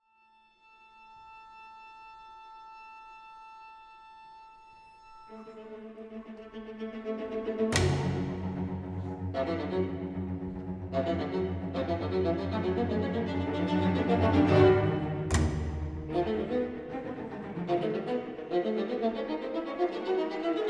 Viola Concerto

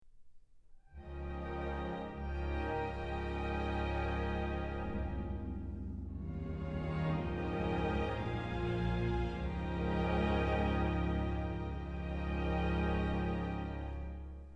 Tuba Concerto Mesto

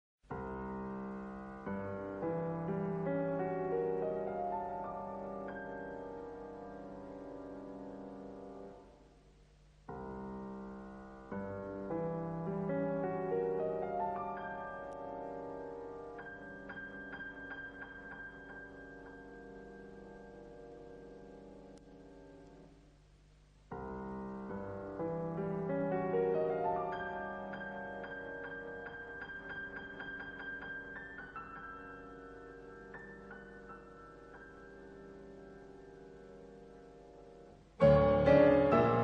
Piano Sonata

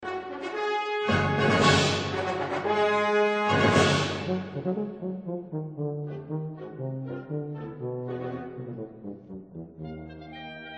Tuba Concerto Wind Band Version; 1st Movement

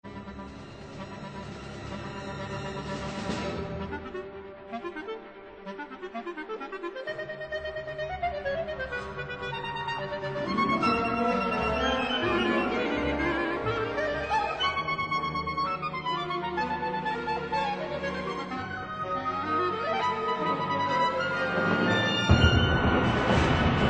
Clarinet Concerto

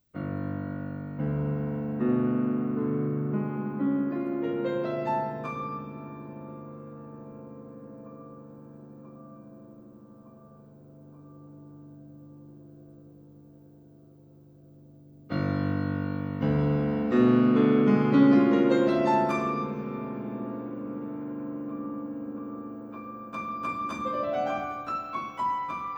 Piano Sonata

